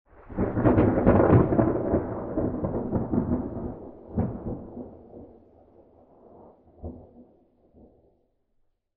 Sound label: Thunder Real Ambi